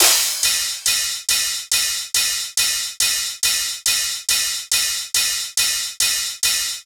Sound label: Cymbals 140BPM, dubstep; Loops; Drums; GhostHack; Dubstep Sounds 2025